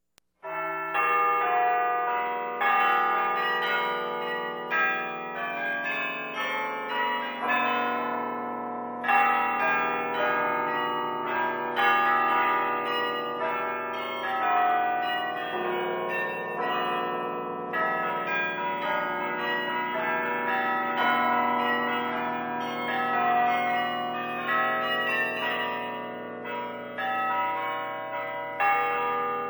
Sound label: carillon domtower - utrecht